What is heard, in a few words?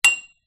impact metal pole small